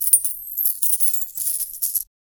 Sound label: create coins sound, currency